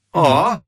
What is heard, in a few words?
aww